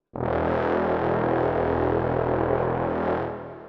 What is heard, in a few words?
Dramatic Brass